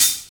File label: old school dry high hat a sharp key